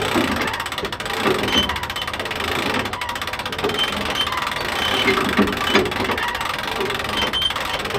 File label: cannon turn loop manual, tanks